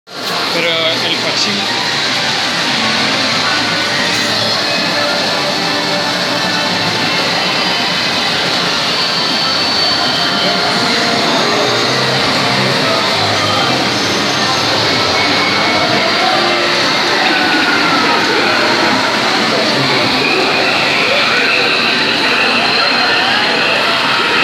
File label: Pachinko